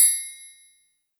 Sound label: hat perc